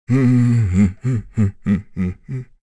Vox Hum; en; Dakaris; kingsraid

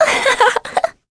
Laugh; Talisha; kingsraid

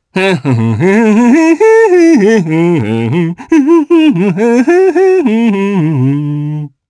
Hum, jp; Ezekiel; kingsraid

